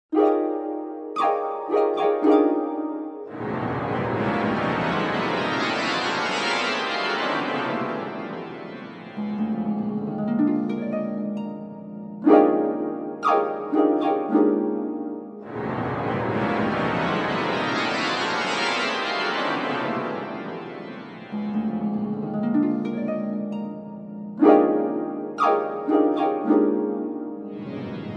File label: Revolving brush car, organ and harp